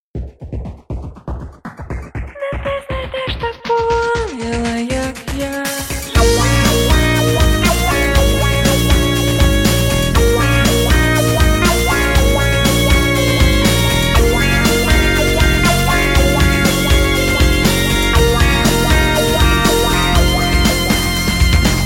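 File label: Angel ringtone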